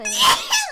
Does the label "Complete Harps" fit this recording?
No